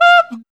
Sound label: monkey; E-Lab; X Static Goldmine 1